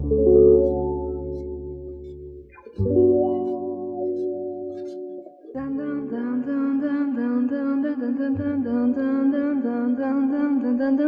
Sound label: guitar and vocals; Loops